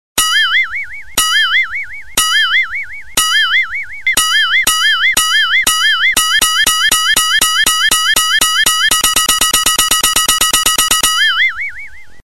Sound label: cartoonic music tone; toy toy toy